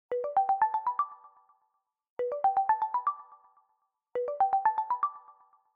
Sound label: in call, phone